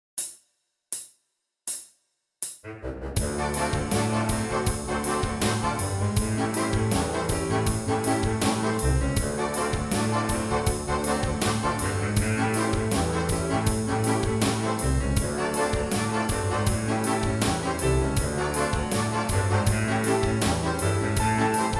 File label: Register Exercise